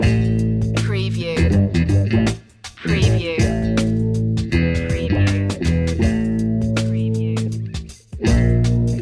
blues loop

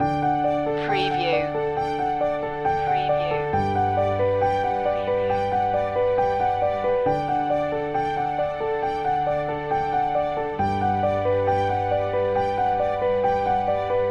forgiveness loop

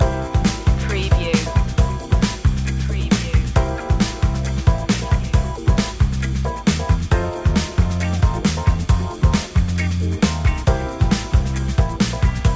brilliant comedy loop

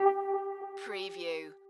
musical

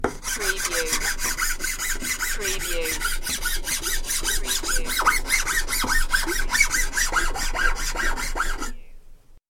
Wood File

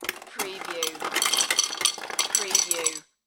Ice Maker Dispense; sound-effects-bible

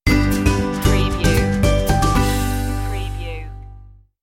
greet the day stinger

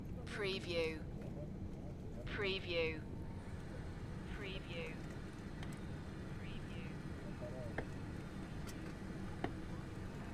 Aeroplane leaving cabin; beanstalk audio

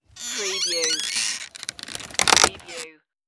wooden hut door close

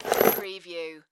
Box Nails Remove; sound-effects-bible

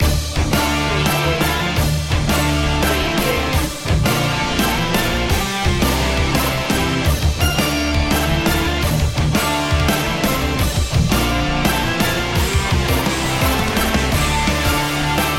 8bit rocktronic

